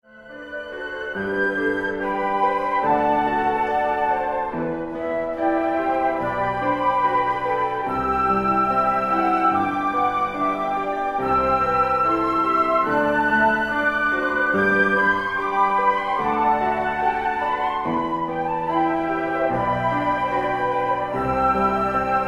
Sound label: Flute